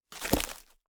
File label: Log thrown on wood bunch; Wood Impacts; Red Libraries